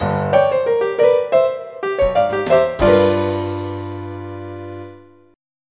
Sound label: blues; r&bmid